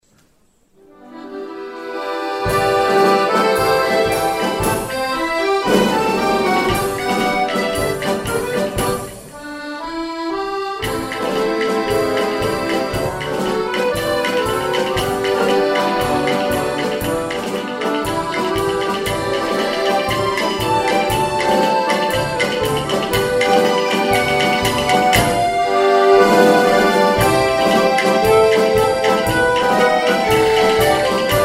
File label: Beguine Melody